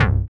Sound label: dance bass; Sound & Vision - Gigapack II CD 1